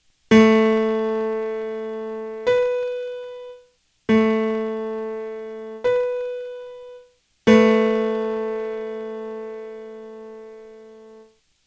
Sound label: Not Octaves, Pitch; Music by the Numbers